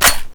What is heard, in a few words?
hk416 click